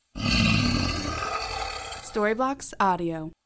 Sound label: breathy monster voice